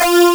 SYN, SYNTHESIZER; DIGI WAVE #1

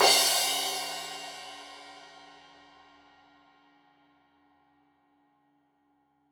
Crash 15in L edge, Cymbals Stereo

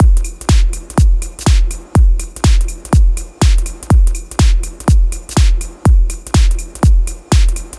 123BPM DRUM, Drum Loops

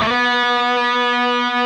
PRS C, Guitars, LEAD

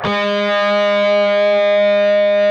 PRS lead

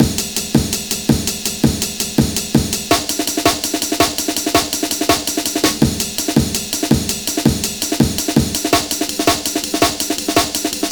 165 breaks; B Hole; Lockdown Breaks

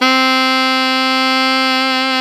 SAX ALTO FF, Alto Tube; Roland LCDP07 Super Sax

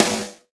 snare, Roland R-70

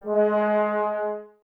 Brass, Woodwind Brass Ensemble

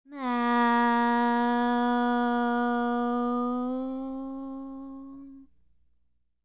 World Voices, INDIA; ILIO; Vocal Planet

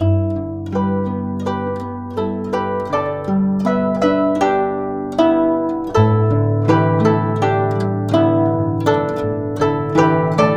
GUCHIN 80 D; INIS - Opium

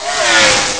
car zoom; Amiga Soundtracker Sample Packs

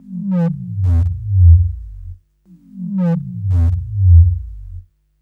Lick Bass, Drum & Bass; Fluid Dynamics

